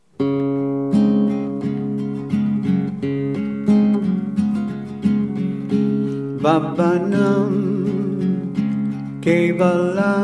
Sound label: morning bird song